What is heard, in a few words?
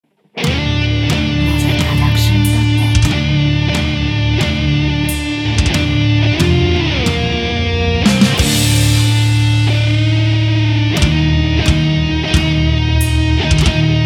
Jingle